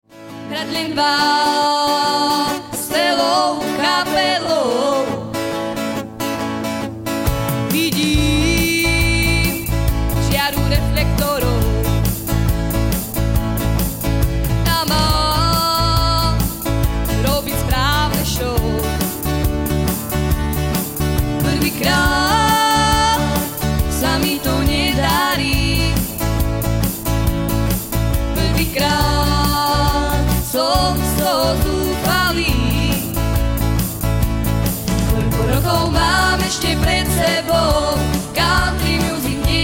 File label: country music